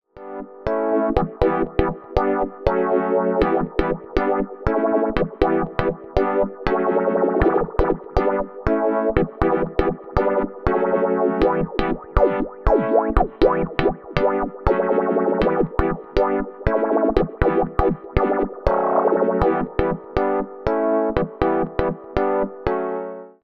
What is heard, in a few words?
Phaser; AKAI MPC LIVE II